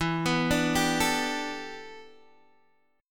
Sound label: E Minor 11th guitar chord, DADGAD